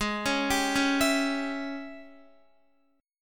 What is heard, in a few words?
G sharp Major 7th Suspended 4th Sharp 5th, Guitar; arpeggiated; chord; x,11,13,12,13,12